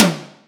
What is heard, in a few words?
Toms